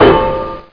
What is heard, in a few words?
foot down; Isaac Asimov's The Ultimate Robot (1994)(Microsoft Home)